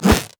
infected cleaver; mundo

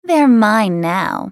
attack; AhriSkin04

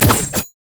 item sightward; LoL; soraka

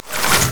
bramble zone splash; LoL; zyra

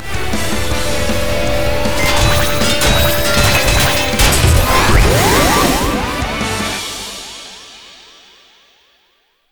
respawn; rumble skin03

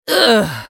dying; Nidalee; Character Voices - English

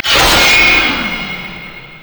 sheath spark; LoL; yasuo base

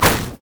satchel charge; LoL; ziggs poolparty

